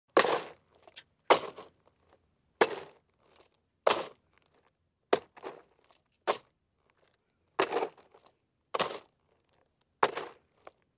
zvuk shagov po graviu so shporami

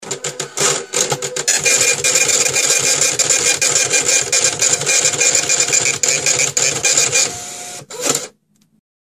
print kuhonn

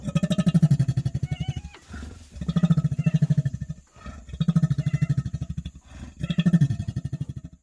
orangutana

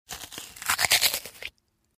zvuk ukusa yabloka

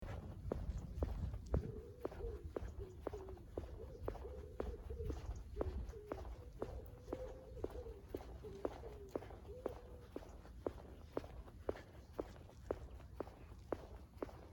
shagi po asfalt tufliami

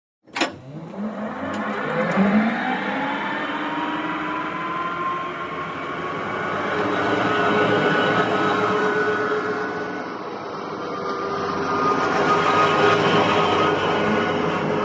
zvuk elektrodvigatelya mashyny

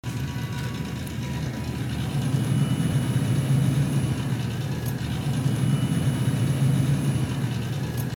morozilinik

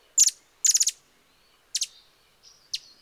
kolibri

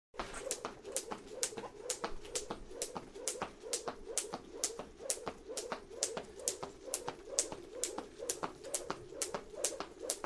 skakalka

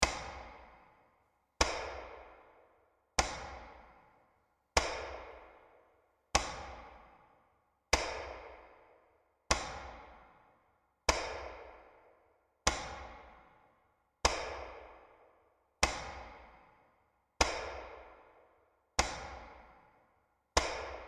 minuta molchaniia metronom

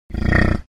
buivol

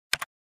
shekchok klaviatury

